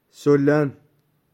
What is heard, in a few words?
Piano; Soulan